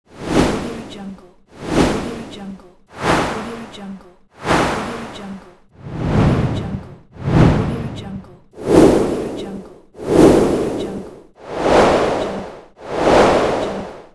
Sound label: whoosh